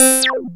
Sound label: Anasound Short hi C, Industrial Analog Single Notes; Sonic Foundry (Sony Creative Software)